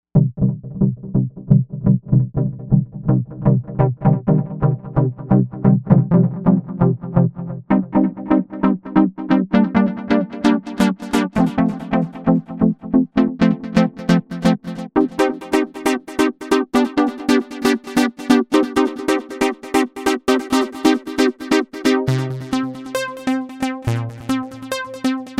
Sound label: TranceLightPluck, Novation UltraNova; Factory Patches